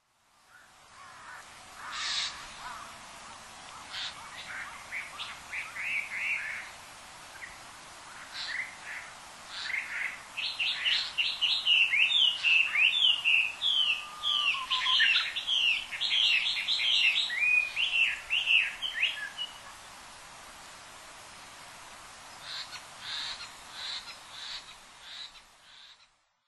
kakesu, fukuoka, koe

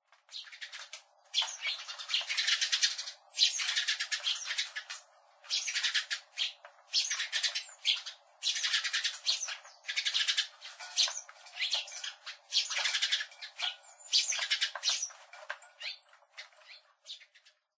suzume, nemuro

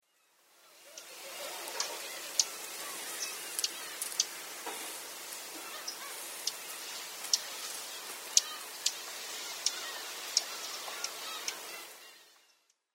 karafutomujisekka; sakata

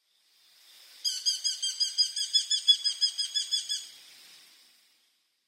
chigohaya, tendo, koe

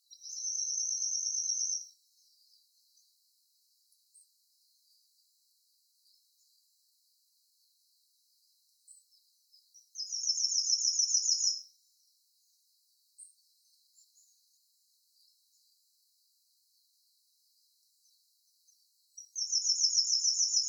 amurumusikui; hyogo; koe

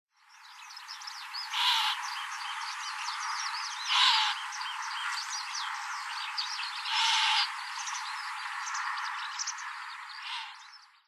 tashigi, hyogo

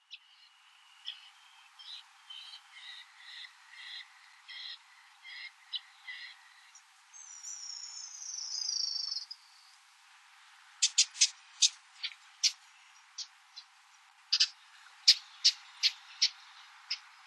uchiyama; fukuoka

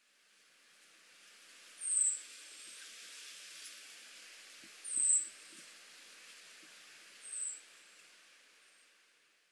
akakokko, koe; kuroda

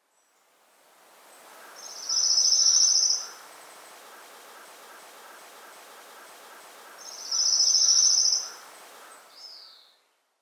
Amurmushikui; yanagawa

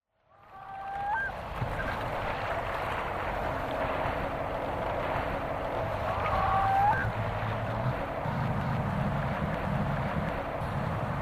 shiroeriohamu; tomakomai

